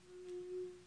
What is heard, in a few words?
whirly tube F2 plus